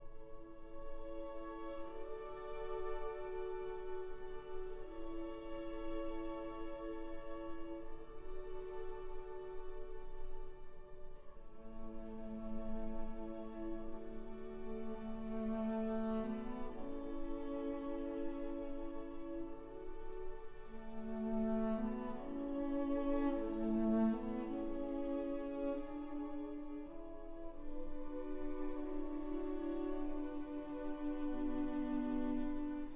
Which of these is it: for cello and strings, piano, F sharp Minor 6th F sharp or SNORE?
for cello and strings